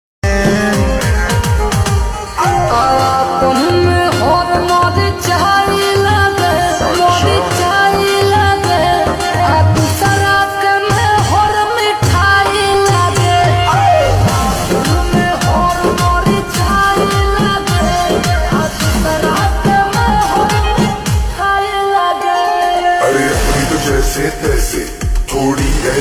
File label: Ringtone Slowed Reverb